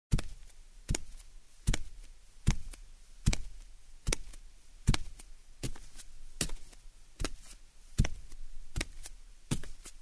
Barefoot steps on a wooden floor

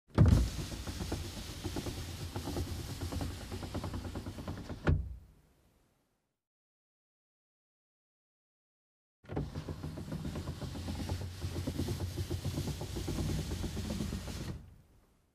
Car glass lowered, raised manually

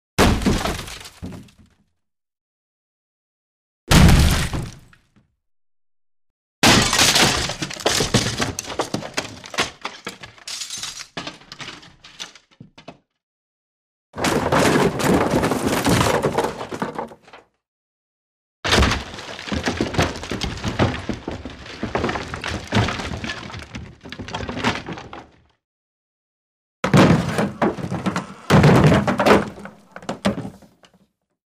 Breaking furniture, wood, blows, chips fly

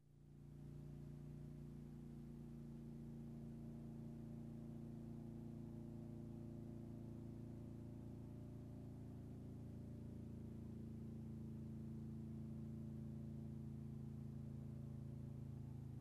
Motorcycle approaching, turning off

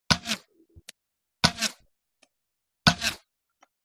Crossbow, the arrow flies by; cold weapons

